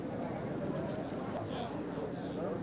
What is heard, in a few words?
Market Crowd; Hyrule Market; Zelda; OOT